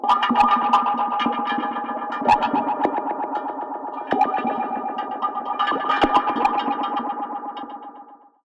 Synth Wah & Tape Delay, contact mic, Tin Of Guitar Strings; musicradar; contact mic madness